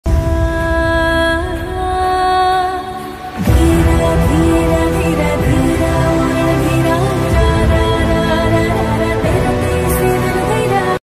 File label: ringtone